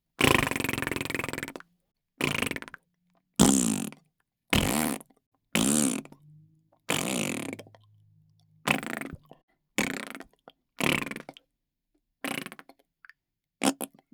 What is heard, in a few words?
BLOWING OUT WITH TONGUE FART RASPBERRY LONG SHORT WET DRY VARIATION; The Mouth